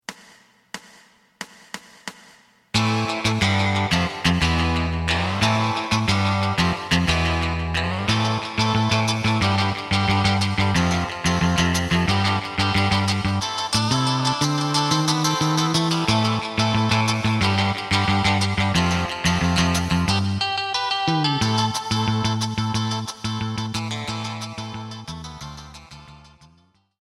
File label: drum